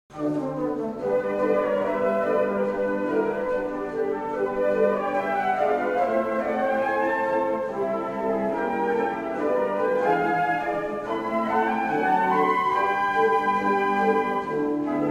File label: concert, flute; Duck's Galop; arietta-flute